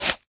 clean slice; Fruit Slicer

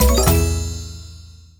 end screen; Dora and Friends - Dora's Wonderful Wardrobe!

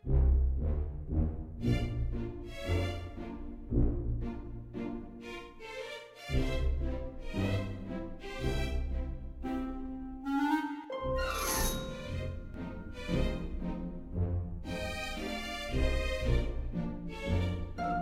ambient music loop; Dorothy And The Wizard Of Oz - Cookie Magic

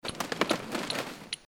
bat; Dora and Friends - Legend of the Lost Horses

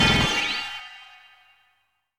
countdown Exit; Battle Day Brawl; ThrillGames

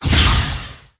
Boost; Teen Titans go! H.I.V.E. 5 Robin Vs See-More

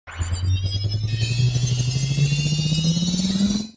game over; Ben 10 - Alien Catcher

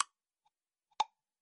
clock loop; Bunnicula - Juicy Bites

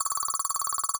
score count up; Ben 10 - Spot The Difference

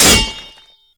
hit large metal sword impact; Ninja Blocker

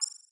code block move position; Ben 10 - Omnicode

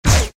enemies worm shoot; Adventure Time - Break The Worm; ThrillGames

